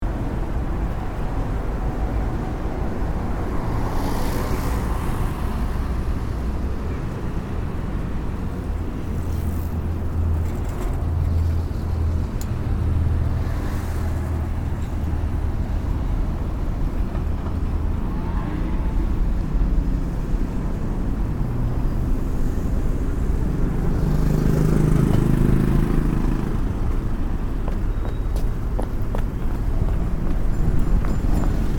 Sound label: streets afternoon